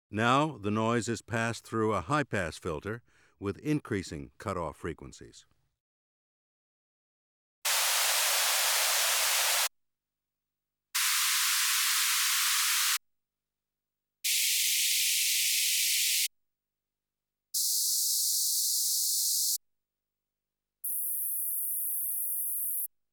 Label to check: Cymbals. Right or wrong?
Wrong